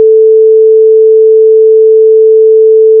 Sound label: sine wave; Basic Waveforms; Audio Synthesis